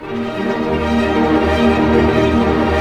STR ARP MA, Symphony Orchestra, STR Arpeggio; Roland LCDP08 Symphony Orchestra; STR Arpeggios

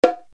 Yamaha RX7 BONGO LO

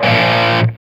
SOLDANO ROCK, Guitars

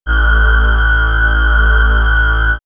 Swirls Drone, pads; X-Static Goldmine

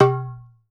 SKINNED UDU

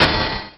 Perc Hit, drums; Drums & Drones

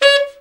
BRA TENOR SN, SAX

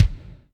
Kik Foot GT, Drum Machines Alesis D4

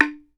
BAMBOO, World Assortment

